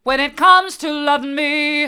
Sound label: hard, vocal